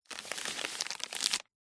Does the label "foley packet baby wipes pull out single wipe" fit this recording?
Yes